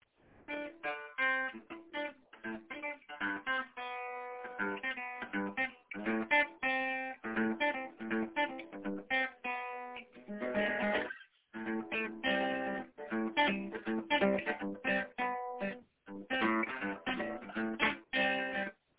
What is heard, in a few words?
groovin lick and chords